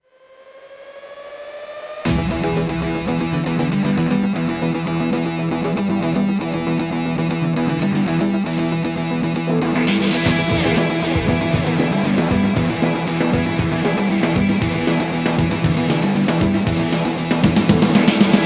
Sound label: BGM Highway Train Intro; Fallen Knight